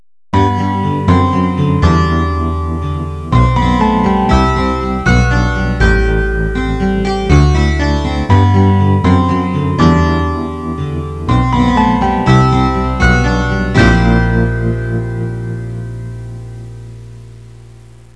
musica newage

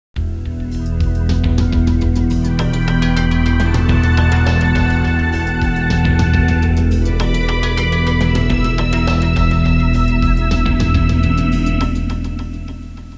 musica tecno; techno

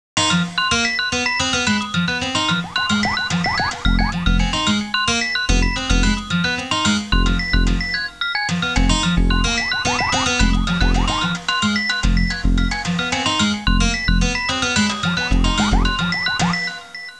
musica elettronica

